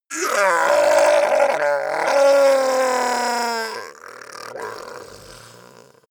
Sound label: goblin groan painful overdramatic